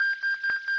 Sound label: dididi; battle